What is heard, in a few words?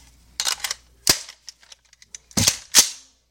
Smack N Rack